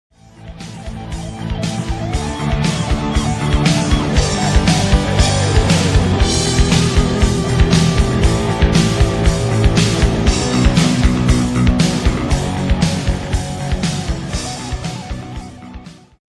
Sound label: No Guitar